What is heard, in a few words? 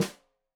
Snare Stereo, Drums; Deeply Sampled Drums; SMDrum